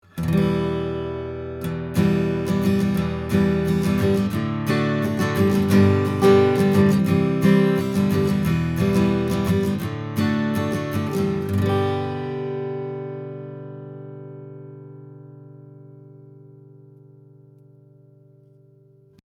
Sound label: Guitar Polardesigner Freestyle Omni Fig8 Wide Cardioid